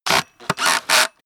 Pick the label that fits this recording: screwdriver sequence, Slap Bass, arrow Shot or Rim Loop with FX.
screwdriver sequence